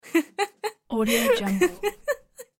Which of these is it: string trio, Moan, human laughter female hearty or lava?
human laughter female hearty